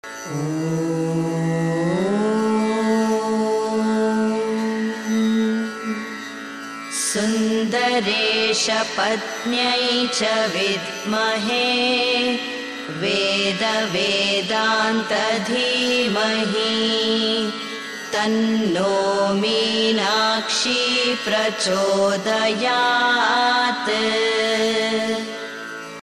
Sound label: meenakshi gayatri